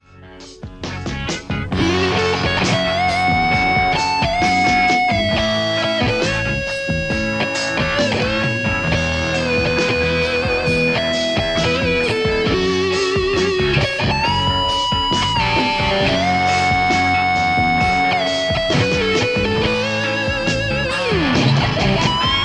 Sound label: les paul; kuassa creme